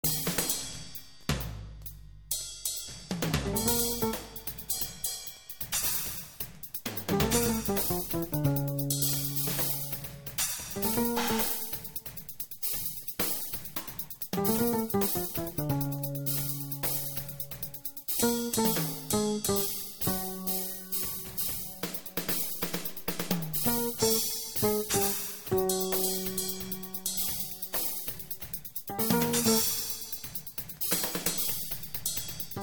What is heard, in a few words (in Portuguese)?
maracatu groove